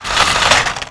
Garage Door; Playable Tutorial Level

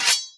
Generic Pull Sword; Playable Tutorial Level

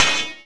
Blades Clash; Playable Tutorial Level

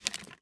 Skeleton Attack; Playable Tutorial Level

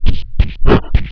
Yeti Grunt; Playable Tutorial Level; TR2

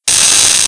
Laser Loops; God Head; Playable Tutorial Level

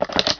Henchman Holster; Playable Tutorial Level; TR2

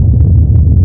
Earthquake Loop; Playable Tutorial Level

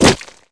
Vehicle Motorbike Hit Enemies; Playable Tutorial Level

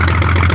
Airplane Idle; Playable Tutorial Level; TR2

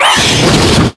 Fire Roar; Croc god; Playable Tutorial Level

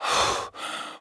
Breath; Lara

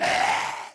Knights Templar Attack; Playable Tutorial Level

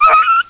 Wolf Death; Playable Tutorial Level; TR1

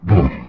Seal Mutant Hit Floor; Playable Tutorial Level; TR3

